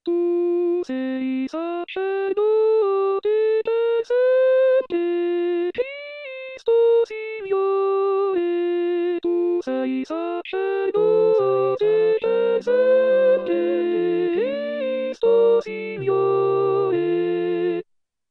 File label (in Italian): Tu Sei Sacerdote Soprani; anno liturgico